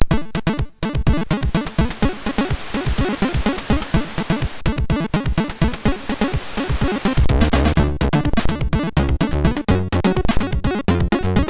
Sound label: No Special, MOS6581R3